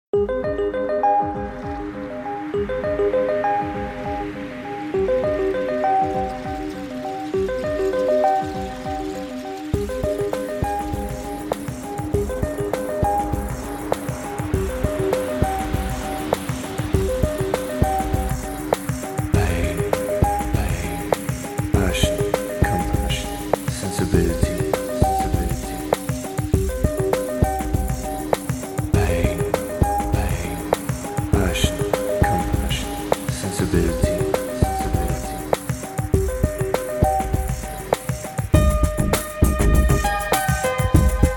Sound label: 432 hz